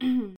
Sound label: throat clearing